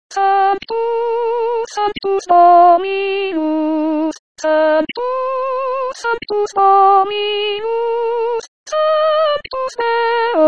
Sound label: Soprano; Santus; Missa pro pace